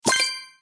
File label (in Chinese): 游戏音效 得到金钱经验奖励